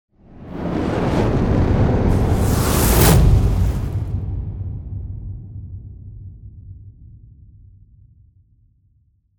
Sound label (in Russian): dlinnyj sdvoennyj perehod; Звук огня; Звук огня для монтажа перехода